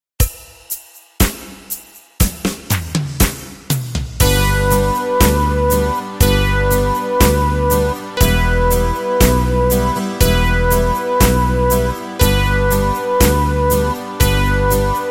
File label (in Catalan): MELODIA